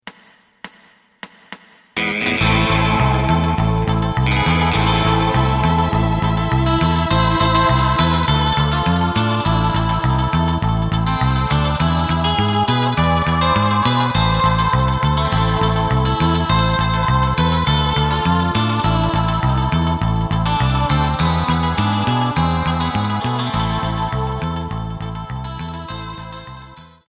drum

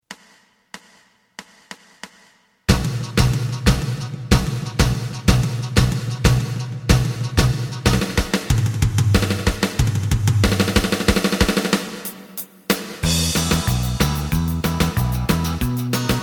lead

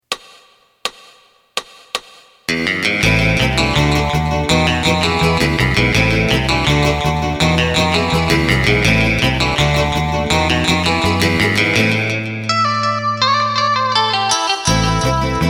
drum

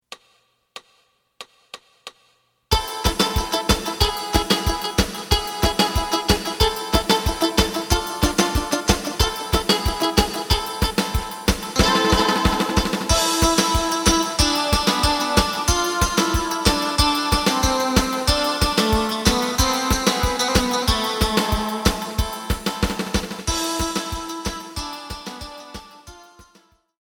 bass

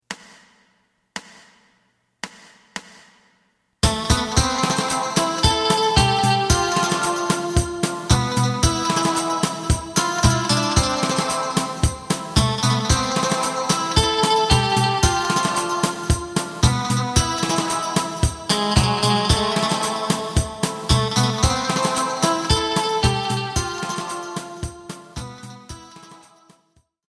bass; エレキバンド用カラオケ

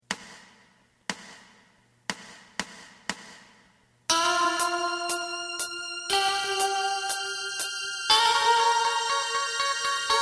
bass; 四季／冬

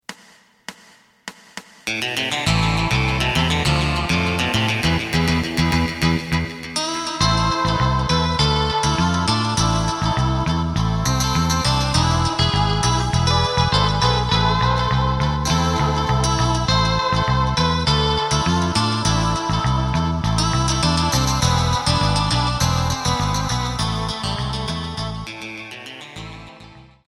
drum

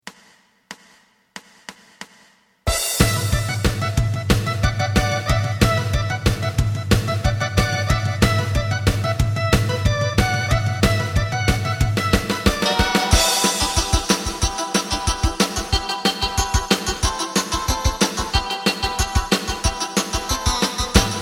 chidori no kyoku bass

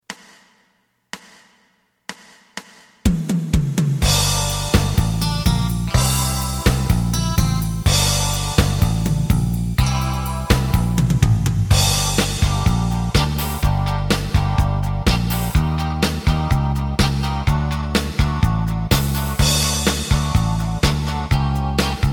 lead

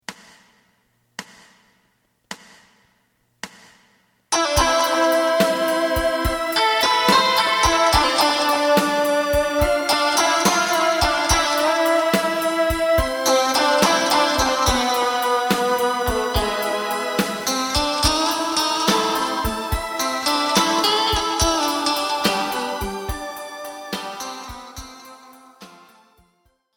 bass

